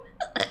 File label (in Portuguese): arroto